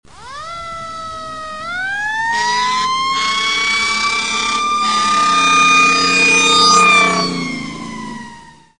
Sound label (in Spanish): camion de bomberos